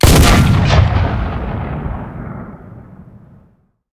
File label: cannon 90mm m3 shot, tanks; War Thunder